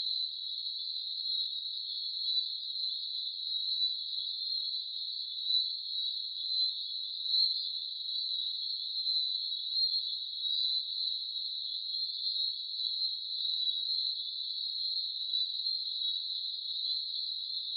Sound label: E cochranae, coqui